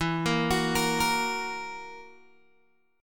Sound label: E Suspended 2nd Flat 5th E down Guitar Open G